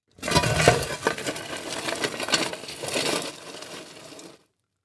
Industry Tool Shovel Dig In Leaf Debris Long

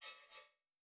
Multimedia Internet CD-Rom Flash Element

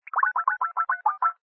Touch Tone Dial Up; skype

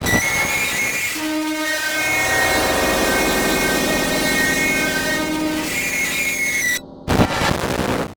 Distortion Reverse Whine Feedback; Module Production Element Title Imaging

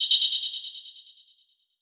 Title Transition Delay Short

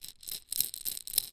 Household Rattle Shake

